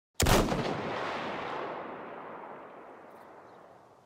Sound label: Lebel, صدای تفنگ; تفنگ لبل